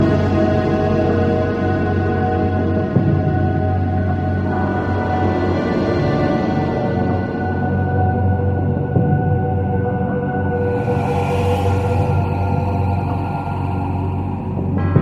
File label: Horror